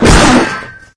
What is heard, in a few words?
Vehicles Generic